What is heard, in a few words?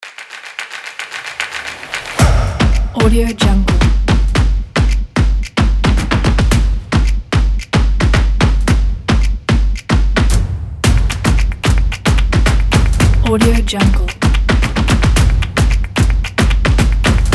epic stomp percussion trailer